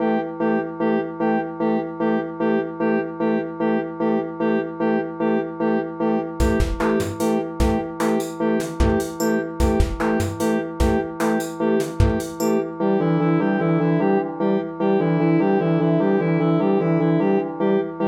beepbox song; BeepBox-Song (2)

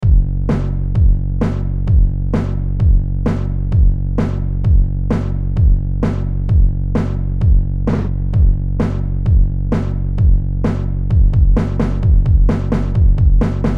tom tom

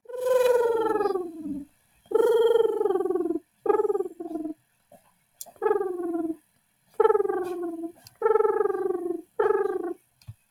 dove noises

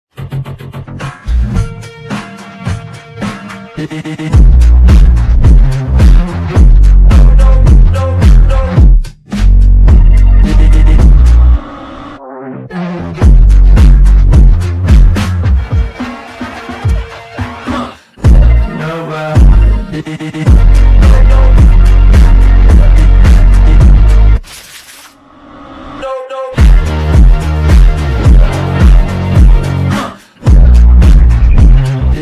phonker; applephonker

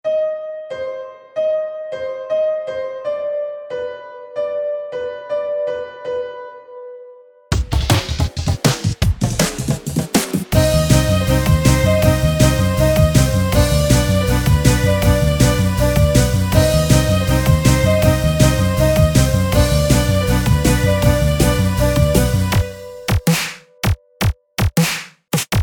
drum test

